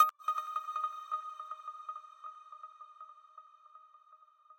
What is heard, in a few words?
arp